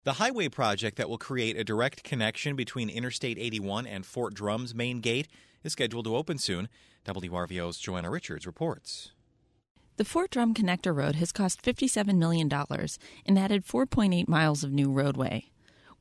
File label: Drum Connector